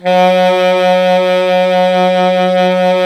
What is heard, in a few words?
SAX ALTO, Ballad; Roland L-CDC-01; BBand Ballad